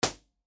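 average space impulse response, live room drum set; genesis 6 studio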